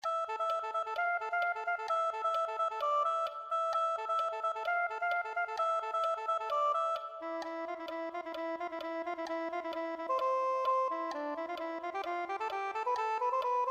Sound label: soprano saxophone